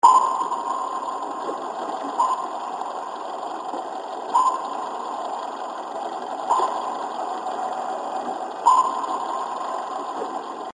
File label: sonar